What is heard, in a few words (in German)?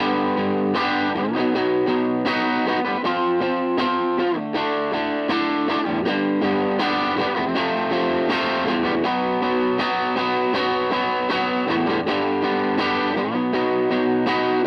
Harley Benton Vergleich HB Crunch; Maybach